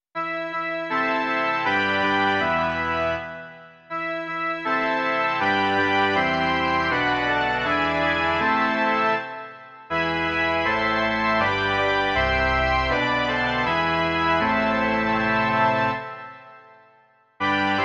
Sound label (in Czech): varhany; Kyrie